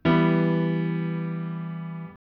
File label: Em lower, chords